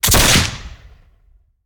Musket; Sound Ideas; Guns

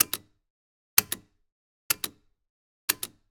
Generic Button Metal Small

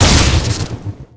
Explo Energy Fireball; Destruction

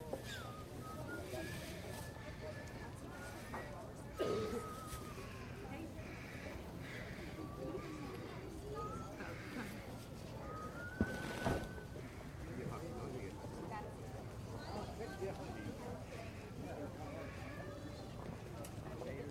Harbor Village Market

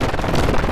MACHINE Cartoon; loop mono; MACHINES & MECHANICS; Universal Sound FX

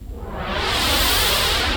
NOIS; XXL 800 Production Effects

